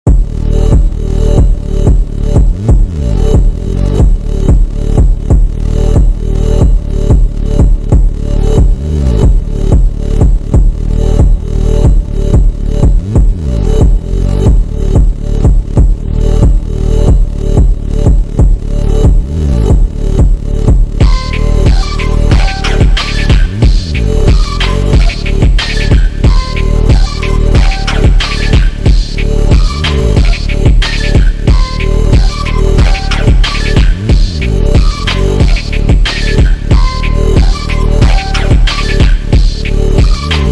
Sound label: instrumental perfectly slowed